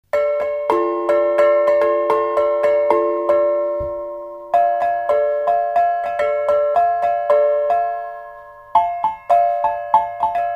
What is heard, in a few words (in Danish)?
koklokke